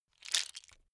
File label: bone small handling right